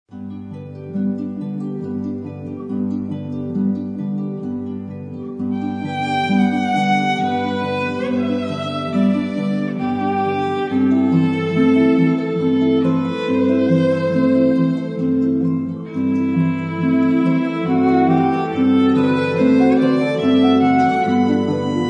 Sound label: viol harp